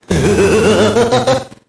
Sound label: laught